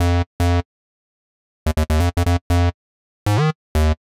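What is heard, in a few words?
Synth Bass F, Nu Jazz House, Bass Loops, 120 bpm; PowerFX - Nu Jazz House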